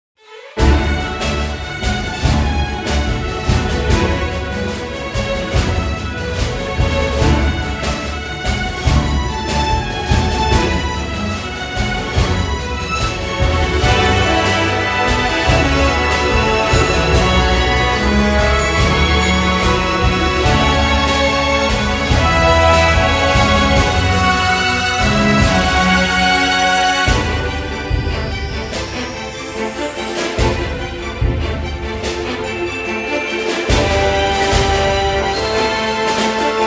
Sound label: Battle BGM